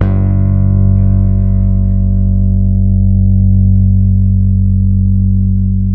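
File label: 8 finger bass G#2; Spectrasonics; Bass Legends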